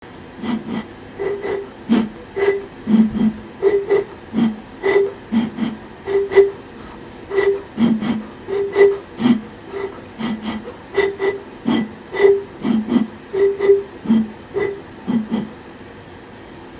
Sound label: Smells good what's cooking starter pack oil and vinegar